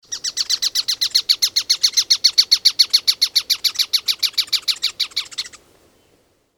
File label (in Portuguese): joao de pau